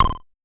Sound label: Pollard Syndrum